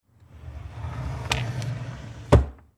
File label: Drawer